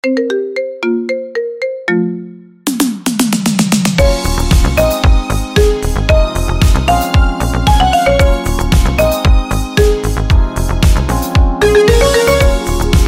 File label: Marimba